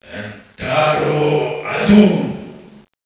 Gothic Magic, ambience; cstrike

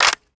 aug bolt release, weapons; cstrike

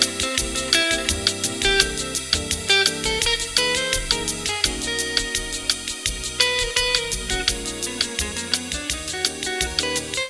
lobby elev music; cstrike

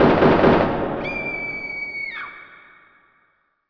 city shots; cstrike; ATC

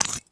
usp silencer screw, weapons; cstrike

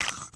usp silencer screw, weapons; cstrike

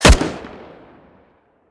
weapons; aug; cstrike

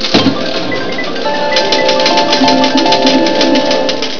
casino slots; cstrike

